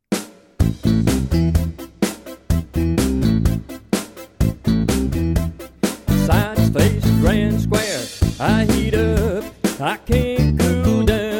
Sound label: abracadabra vocal